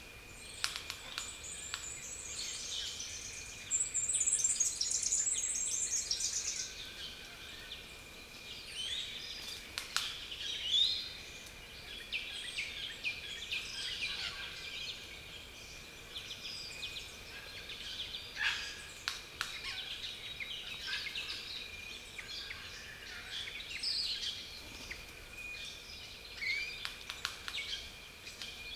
Anthochaera, پرنده مرغ پوپی

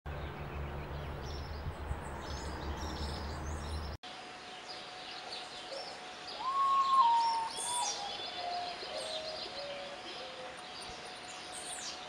nyctibius

